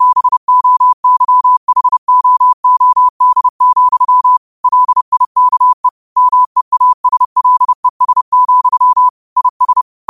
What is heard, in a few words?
5 wpm, morse